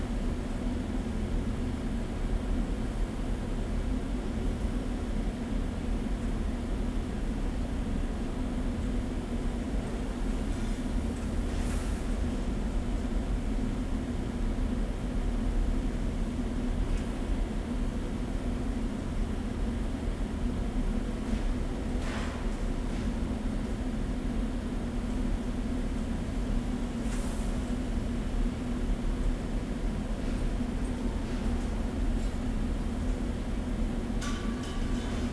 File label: kitchen ambience; Star Wars Obi Wan; XBOX